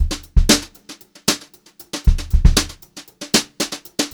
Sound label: beat, Brushed Drum Loops; USB Soundscan vol.39; AKAI; HOTROD